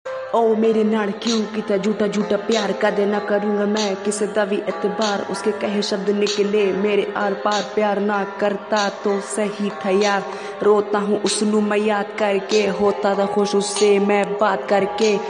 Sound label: sad rap song